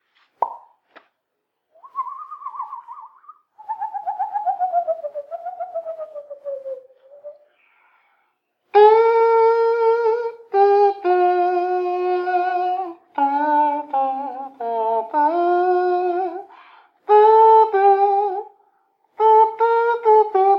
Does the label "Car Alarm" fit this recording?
No